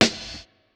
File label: Kit, breakcore Drum Hits